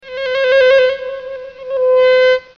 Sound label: bow trill